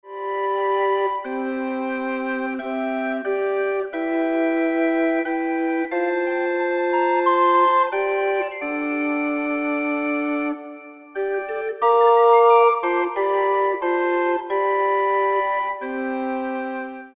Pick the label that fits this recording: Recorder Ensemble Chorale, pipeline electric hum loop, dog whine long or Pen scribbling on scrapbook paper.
Recorder Ensemble Chorale